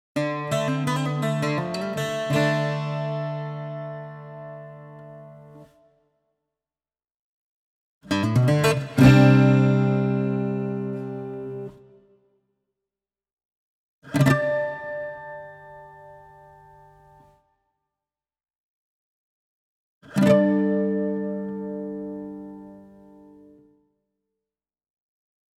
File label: Jingle B Bouzouki version; Secrets of the Silent Witch Original Soundtrack (2025)